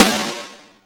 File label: snare gated; E-MU Formula 4000 Series Vol. 2; OrbitPercMaster